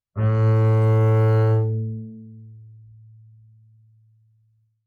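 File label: Bass arco fortissimo sul D A2, Double Bass; MIS Pitches - 2014; Strings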